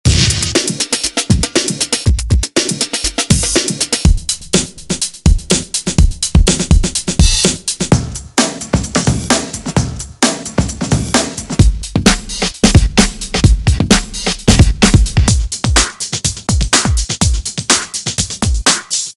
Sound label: Breakbeat loops